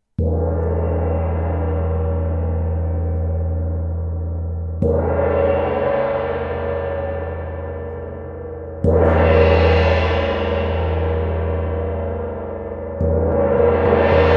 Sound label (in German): sonnengongs